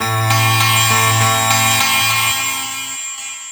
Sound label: Starter Guitar; Strings; Sonic Foundry (Sony Creative Software); Myths of Technology